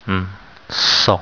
song

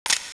pipebomb turn on

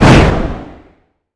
beamgun jump, weapons

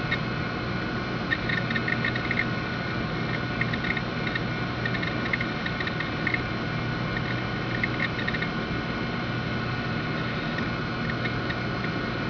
com noise, ambience

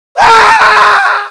male infection

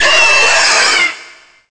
alien death, zombie

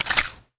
stock push, weapons

